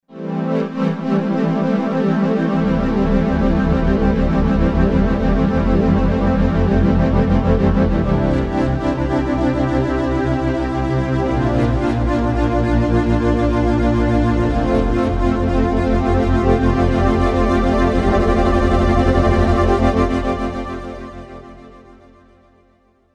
Pad Vari Pad

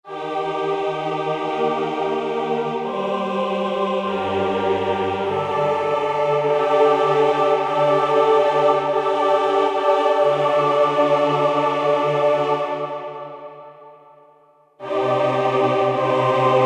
Choir

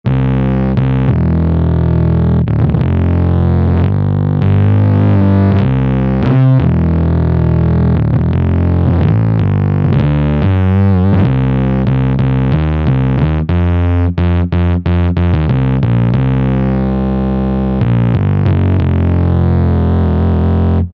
Dist Bs, Roland SRX-07 Ultimate Keys

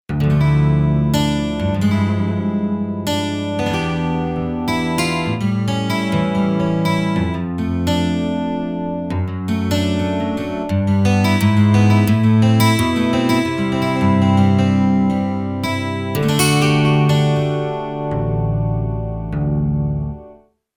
Nylon+Steel, Roland JV-880 Factory Patches

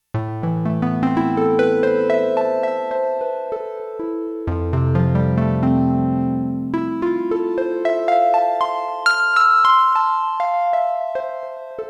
SYN HARP, Roland JX-1; Factory presets

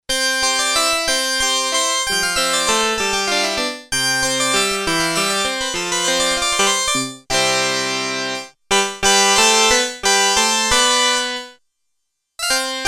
HARPSICHORD

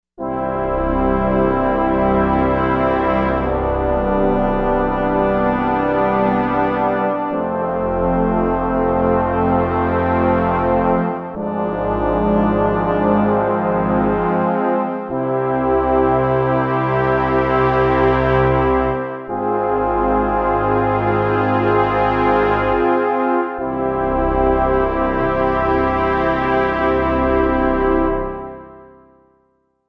Regal Horns

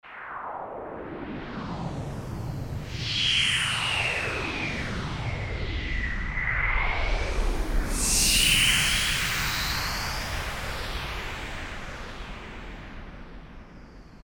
Roland JP-8000, Meteor; Factory Patches

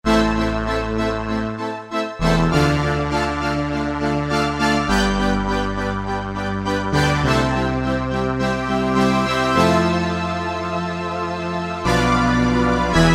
Alesis Z4 Vintage Keyboards; ArtQuartet

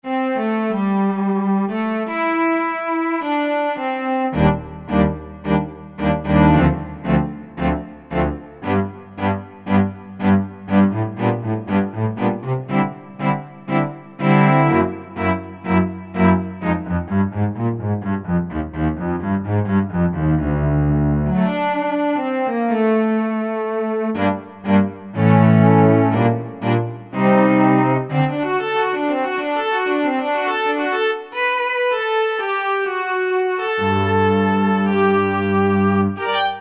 Chamber Ens, Korg N364; Factory Prog demos

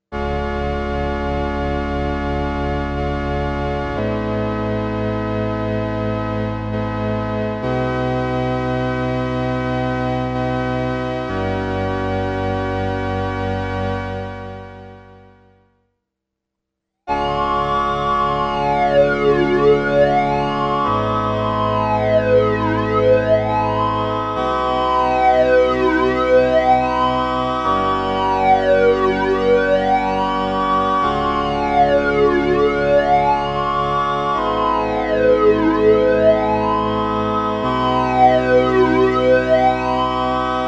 Classic Flange

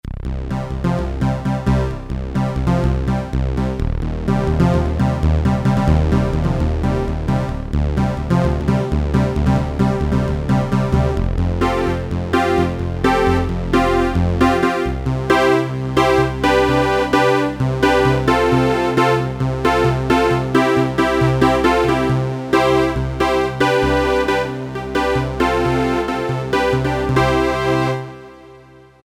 Yamaha PSR-300 SYNTH BRASS; Voices

